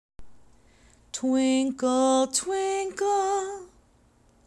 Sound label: Twinkle Twinkle Fifth